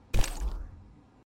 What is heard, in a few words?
Grenade Charge